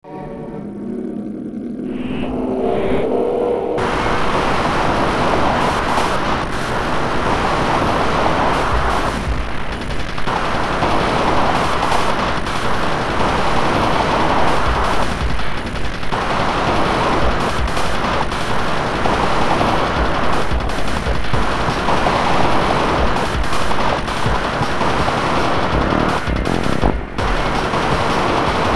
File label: Introspective Noise